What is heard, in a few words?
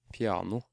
Piano